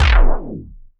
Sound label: TOUCH HARD, Anime Sound FX, SCIFI